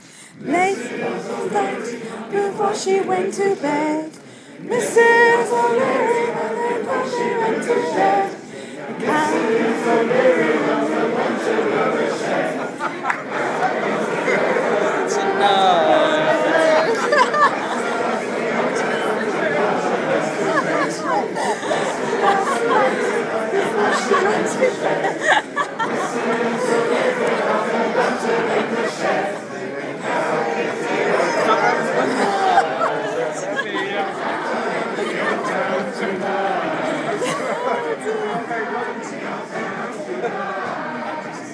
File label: singing; at tedxbrighton